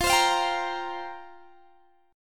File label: F Suspended 2nd Suspended 4th, down, Guitar, Open G